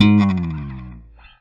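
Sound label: slap, bass; Xsample VOL-1; SLAP+THUMB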